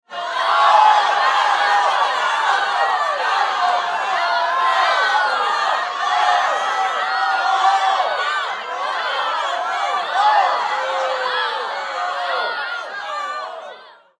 Human Crowd Studio; Crowd Reactions Scream No Multiple Loud; Blastwave

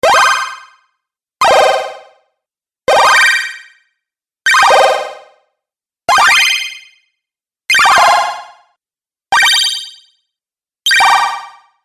Classic Delay Game Hit Sound Collection; Video Game Sound Effects

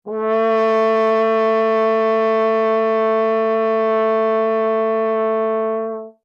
HORN A4 FF LONG, French Horn in F; Alexander French Horn in F; Musical

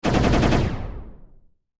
Science Fiction Laser Machine Gun Rapid Fire; Lasers

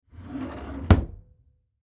Bathroom Drawer, Drawer Under Sink Close Hard; Household; Blastwave

